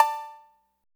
808 COWBELL; Drums In Your FACE!

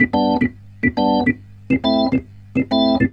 Roots Reggae, B-3; Sounds Good - Roots Reggae

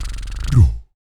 VOC PERC, Vocal Planet Jazz FX BASS PERC

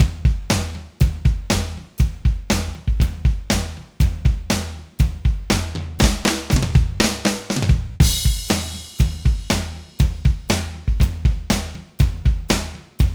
120 live drums

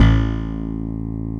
DIGI BASS; DIGI BS

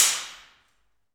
Aluminum Wall, FX Perc; Roland L-CD701

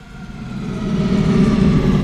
SCI-FI FX; Best Service; XXL Production Effects; Sample CDs

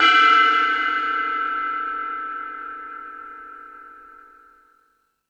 Tool Kit, Drum Machines

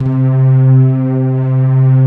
M MUTE PADS; Pro-Rec - Synthsonic

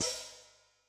Splsh, HiHat; Yamaha DTXpress-II

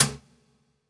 Cajun, Percussion One Shots; Essential Percussion

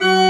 CHRCH, CHURCH ORGAN; Roland - LA Composer vol. 1

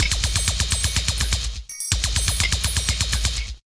easy uzi, Deep House 125bpm

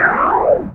Whip

